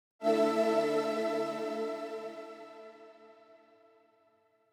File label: simple chord sweep a maj; fx; base pack